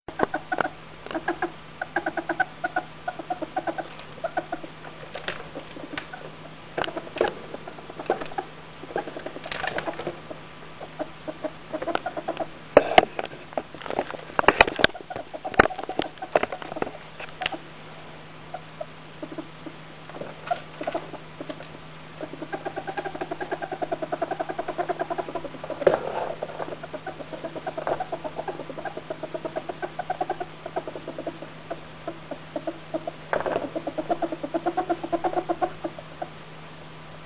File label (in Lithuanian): gugavimo pavyzdziai gu